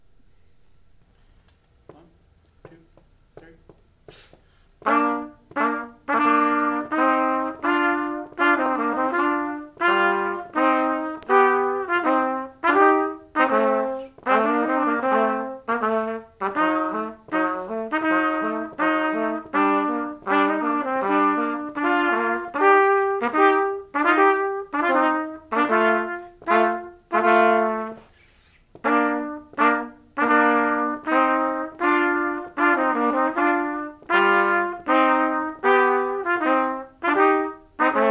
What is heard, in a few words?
marche duet; campagnoli